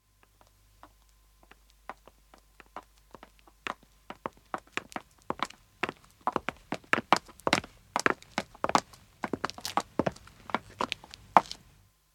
Footstep Pav Walk, 1 man 1 woman approach and stop; BBC